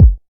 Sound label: dry steel kick drum g sharp key